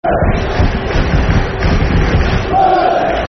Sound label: Clap, Football Chant (Soccer Songs); Rovers (Clap); Bristol Rovers Brfc